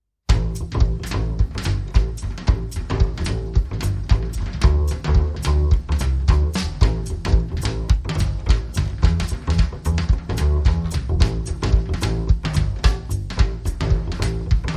Jive Bass Go Round, Acoustic

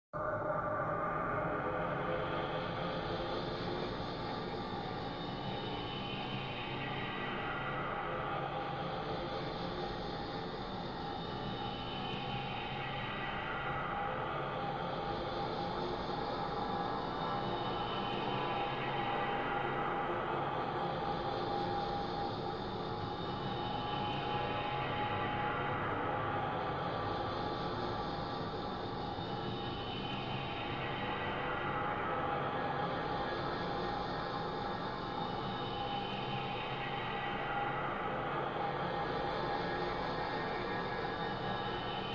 Drones

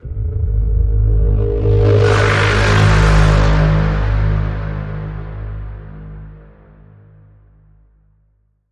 Bend, G sharp

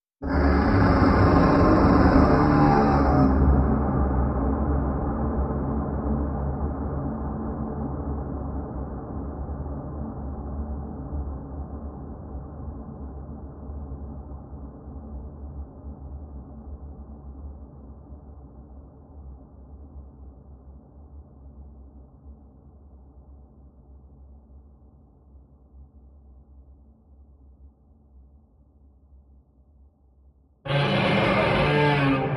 Whale SFX Only

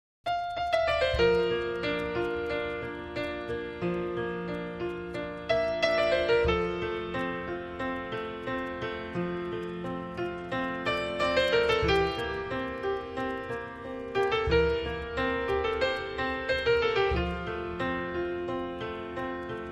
Piano Only

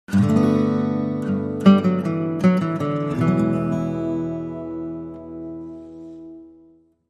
Link Sting

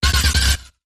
Distorted Pounder

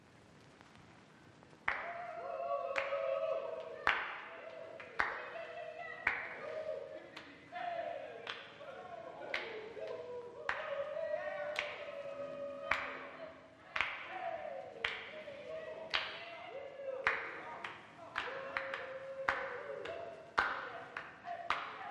Jazz Club

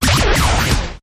Twirling Zap

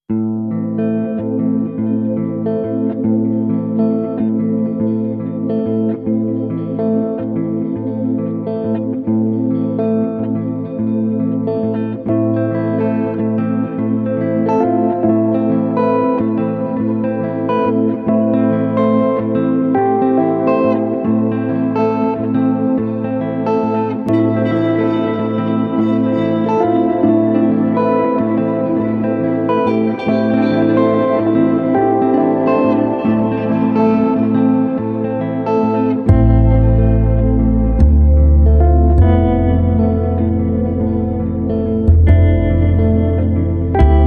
Ambient

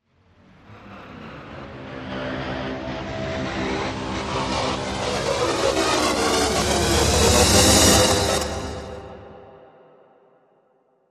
Riser

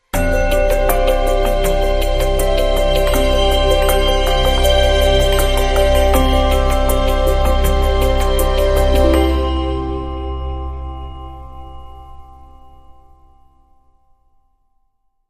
Eastern Forest, Sting

